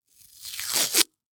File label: Emergency Band Aid Ripping Off Patient; emergency effects; AdobeCC